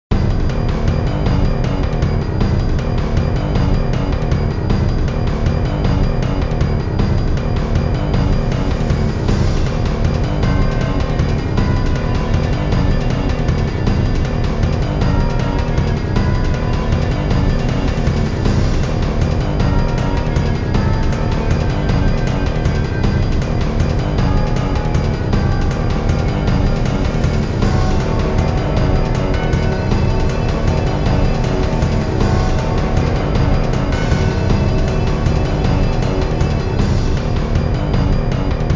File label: Boss Battle; power rangers time force ps1 gamerip 2001